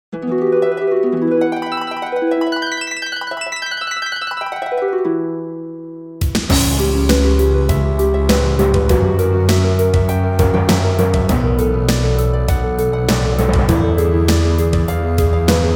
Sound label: midi arranged harp; pegasus' harp ~ name entry